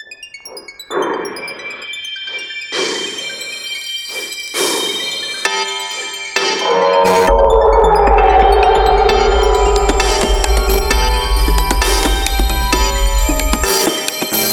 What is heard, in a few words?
Danger Energy shield 132bpm